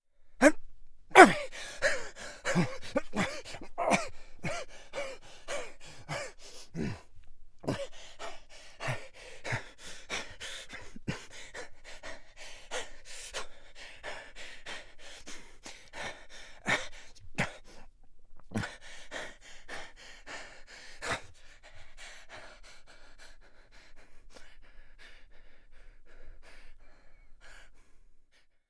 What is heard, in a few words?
Foley Jump from Train and run off - breathing